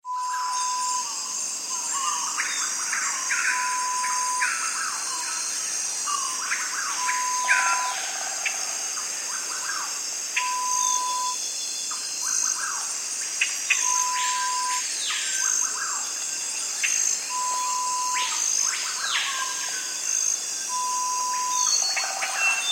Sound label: Rainforest sound